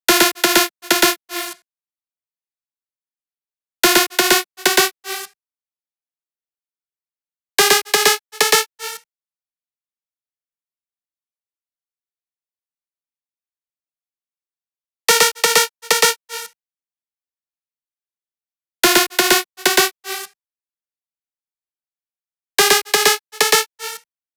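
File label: 128BPM Drop Lead dry SC, Synth, F minor; Vengeance Essential Clubsounds Vol.5; VEC5 Demo Constr Kits; Mainstage Kit; Root Fmin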